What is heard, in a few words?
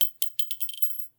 shell drop